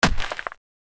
ground shaking rock smash

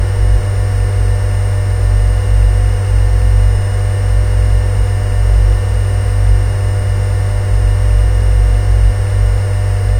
a continuous low mechanic, machine

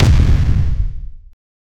heavy ground slam deep impact

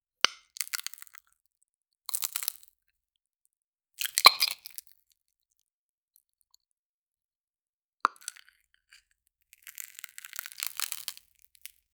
knife cuts into rind soft, cake

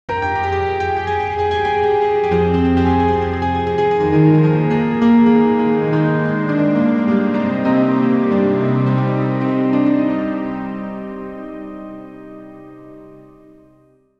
gentle piano with hopeful, film score

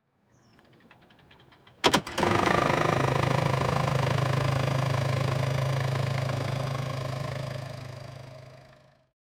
v8 boat start sound; sound effect

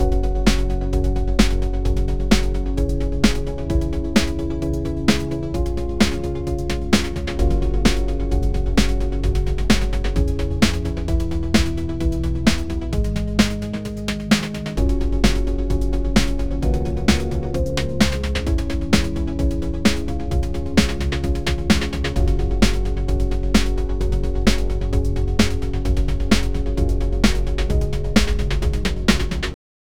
upbeat deep focus music, coding

